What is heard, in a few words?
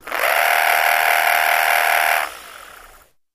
Motor, Small; Low Speed, Start, Grinding Purr, Stop